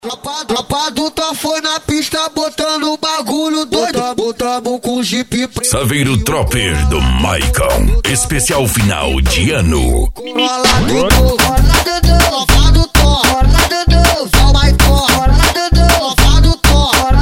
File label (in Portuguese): funk agressivo funk